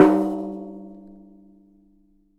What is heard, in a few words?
Strike, Washing Machine Drum Rim